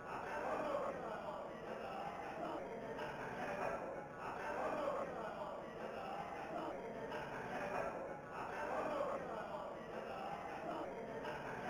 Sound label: chatter; International Wrestling Festival 2018 - Aniki's Legacy; IWF2018 Gift Pack; MajorMilk's Assets